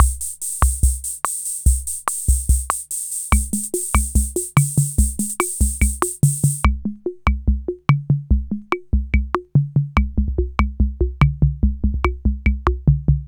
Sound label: R77 Rhumba & Bossa; rhythm77